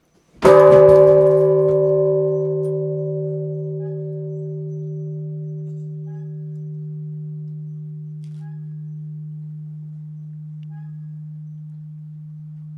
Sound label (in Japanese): bonsho